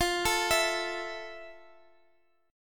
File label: F Major 7th Guitar Drop D, chord; down; chord shape